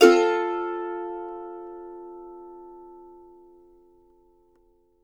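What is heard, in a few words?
MANDO MAJ F major up, Right; Sampleheads; Whole Lotta Country 1 & 2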